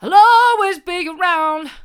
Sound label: i'll allways be around hard, vocal